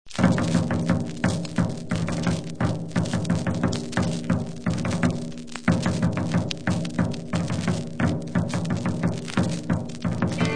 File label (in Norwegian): Inntogsmarsj, folkemusikk